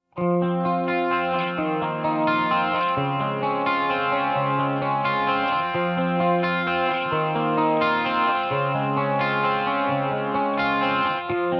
Lovely Music

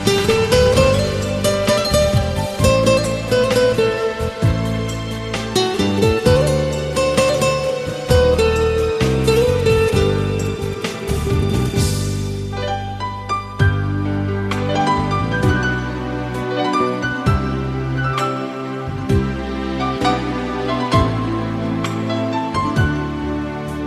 Perfect Persian

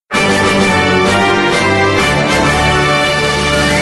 Victory Fanfare

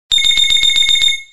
Nokia X8 Mms

